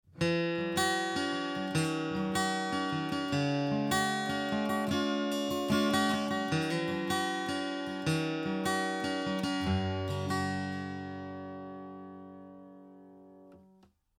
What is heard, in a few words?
114ce C414 Arps; LTD